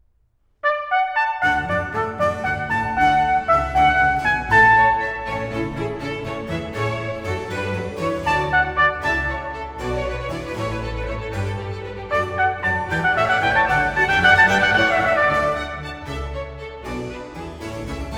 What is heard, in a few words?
Concerto, for trumpet & strings in D major, Allegro; Haydn, Hummel; Etienne Roger 188